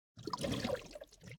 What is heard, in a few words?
flame trail; Smite; Sol